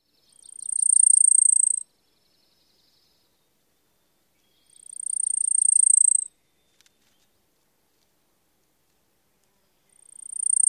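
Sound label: songs very fast-pulsed, Blackpoll Warbler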